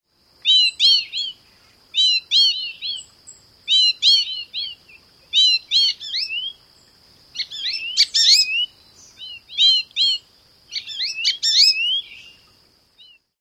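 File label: American Goldfinch alarm; bird language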